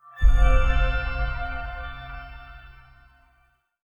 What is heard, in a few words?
journal note